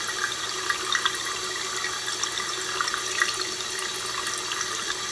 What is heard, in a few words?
kitchen; Star Wars Knights Of The Old Republic I; XBOX